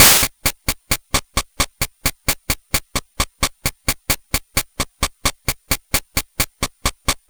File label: Game Boy Noise Crash Hats Loop